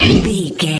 troll monster attack fast

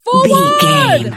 voice female a battle shout forward

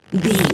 bow crossbow arrow draw stretch

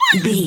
voice fun creature small mutant voice emotes

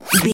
foley zip zipper short

